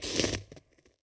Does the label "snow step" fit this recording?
Yes